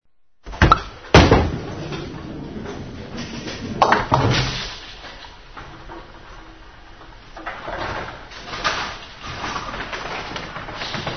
BOWL

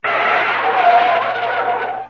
tires squealing

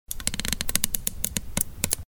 string tension

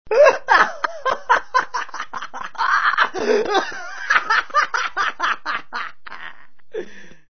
laugh

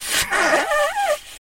nose blowing

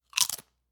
Potato Chip Crunch

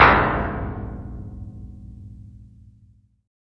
METAL TAP

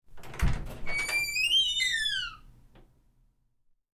Door Open With Squeak

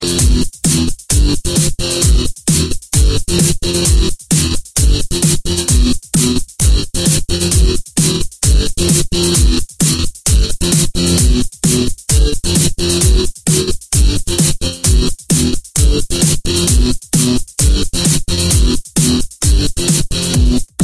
hip hop beat

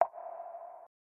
water droplet

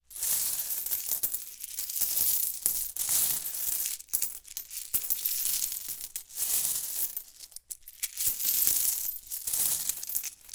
Coin Bag Filling

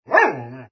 ANIMAL DOG

